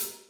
good foot hi hat sound g key

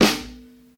high quality short reverb snare A key; drum samples

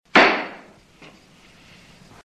judge gavel single

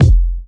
steel kick drum sample F key

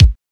small reverb bass drum single hit g sharp key

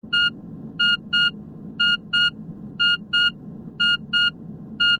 beeping noise alarm clock

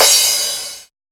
crash one shot d sharp key; Cymbal; drum sample

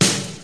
Old School Airy Acoustic Snare Sample G Key; snare drum samples

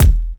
short kick drum e key, Loudest frequency: 1072Hz; kick drum samples

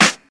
lo-fi snare drum sample g sharp

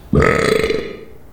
belches loud with echo too

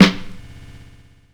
huge snare sound F sharp key

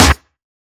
focused steel snare drum sample c sharp key

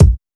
thumpy kick drum sound A key

